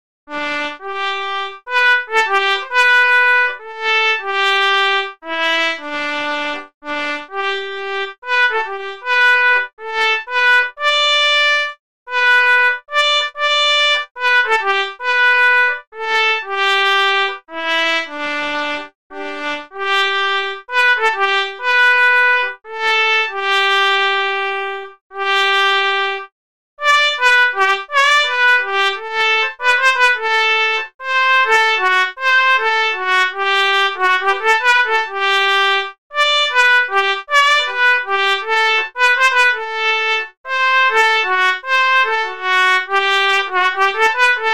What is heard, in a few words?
Trumpet Solo